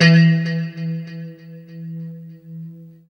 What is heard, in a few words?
LO E E 3, Guitars; Spectrasonics; Hans Zimmer Guitars VOL-1; JC-SHEEN